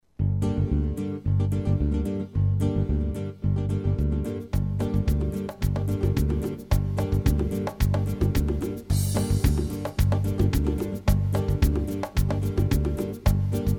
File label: Samba